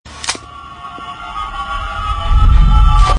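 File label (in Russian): свист приближающейся опасности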